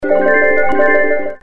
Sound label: Casino Snd